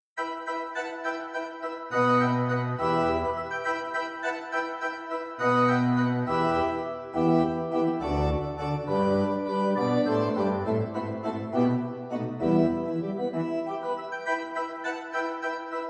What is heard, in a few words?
We Three Kings Organ; christmas